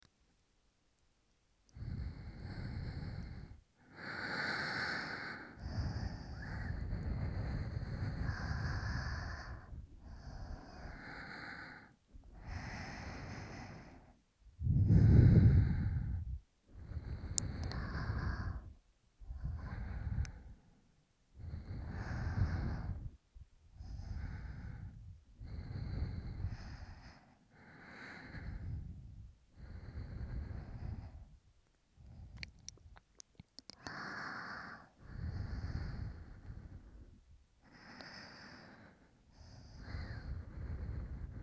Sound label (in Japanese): 寝息, ASMR; 音量up版